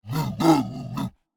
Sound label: MONSTER Yeti grumble